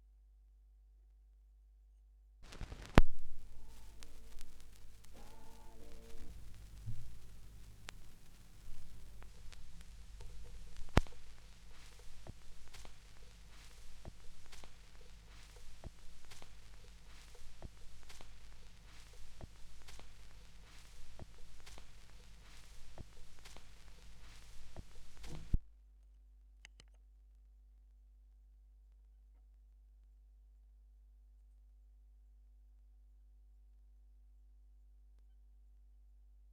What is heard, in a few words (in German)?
schallplattengeraeusche; rauschpartikel